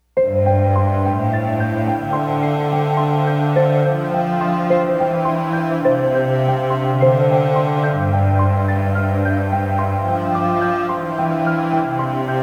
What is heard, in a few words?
Sad Sea